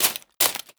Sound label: Spearman Run; KD Fargels; Seer; GamigoNA